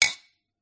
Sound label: pub pint glass cheers toast; field reports